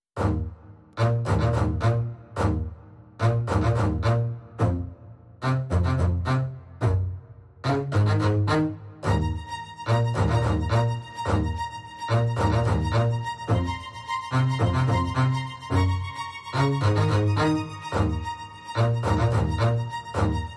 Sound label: Strings Mix